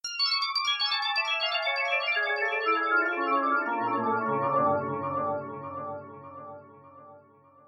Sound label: arpeggios echoed synth